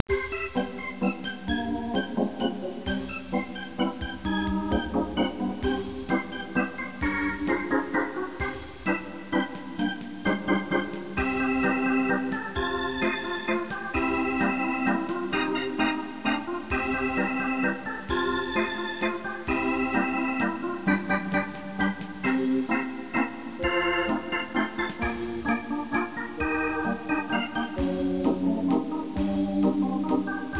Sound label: Piano Waltz; Spy Fox in Dry Cereal